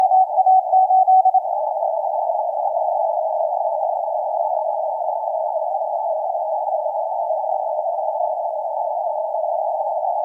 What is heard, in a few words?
15m CW